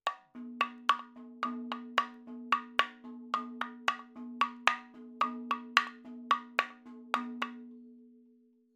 110 7 8 MADALAM, percussion; Sample Banks; MADHALAM; MADALAM 7 8 FILES

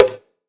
Perc; Sample Banks; Percussion

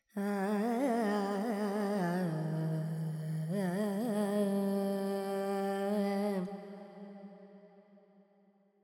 FX Lydian, Vocals, Carnatic Female, Alaps; 70 G; Sample Banks

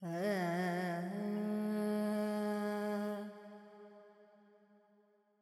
Pentatonic, Vocals, Carnatic Female, Alaps, G; Sample Banks

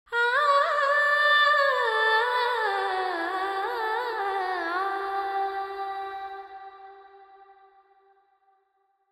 FX 4-4 G 100, Vocals Female

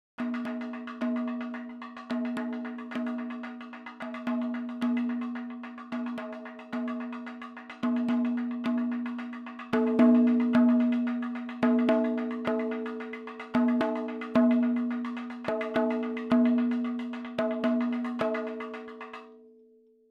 110 7 8 MADALAM, percussion; Sample Banks; MADHALAM; MADALAM 7 8 FILES

